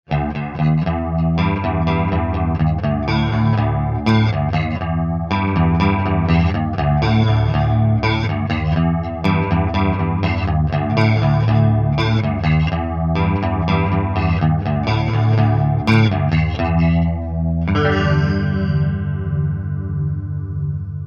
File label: chorus as you are